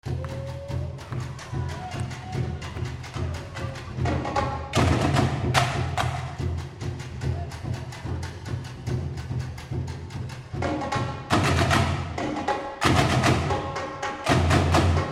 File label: mangue; rio2001